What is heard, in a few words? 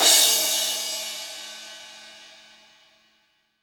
Crash; FPC